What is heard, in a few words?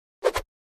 Fencing Sword Swish